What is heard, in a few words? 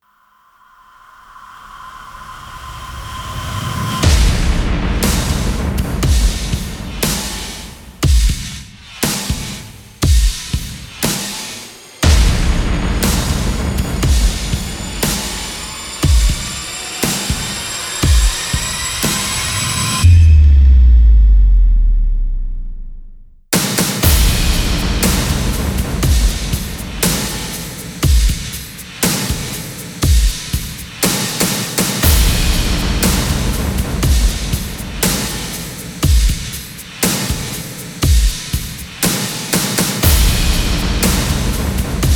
percussion only